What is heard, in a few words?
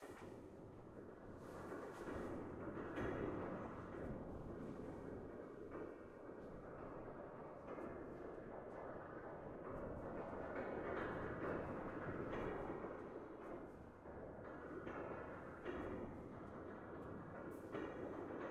Impromptu Noise; Schubert